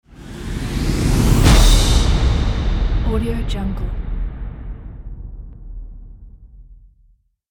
transition hit

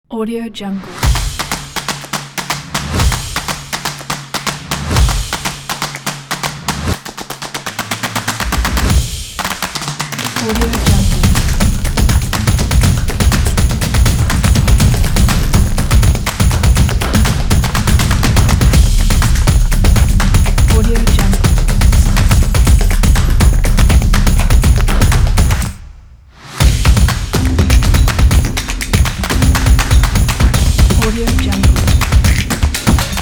this is drums; fatbunny

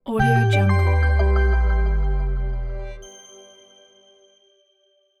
digital technology logo

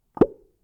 boink wet suction pop blip hollow; prosoundfx

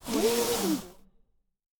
car seatbelt unwind

